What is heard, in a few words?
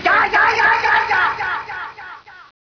x recharge; svencoop; cracklife